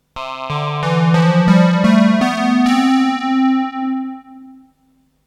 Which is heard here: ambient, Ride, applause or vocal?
vocal